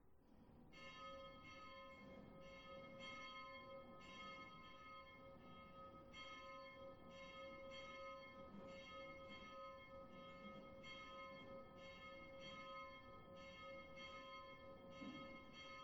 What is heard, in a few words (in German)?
bell south tower; 11 tarzisius